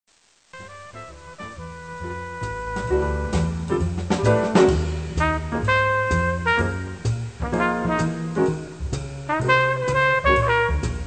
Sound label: trumpet; Jazz Conception for Trumpet